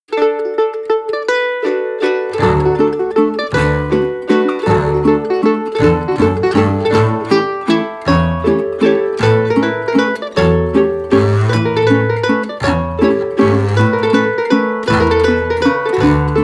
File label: Waltz